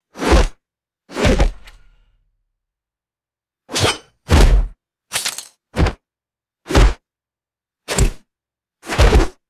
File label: arrow whooshes and solid impacts; weapons